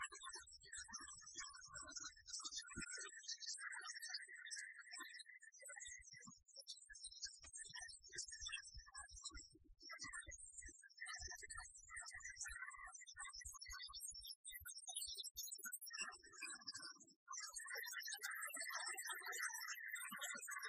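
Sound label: saxophone ringtone; sexophone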